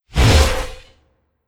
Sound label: Flame Eater Spell